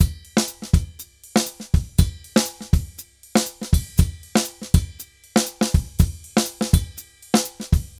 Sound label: Drums Bomba 120, 120 bpm; Best Service; Festa Latina VOL-1